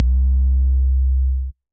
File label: Sub Terra, bass; Ableton; Glitch and Wash